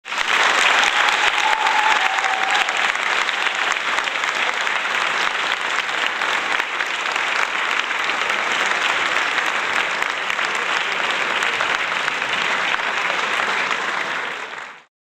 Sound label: applause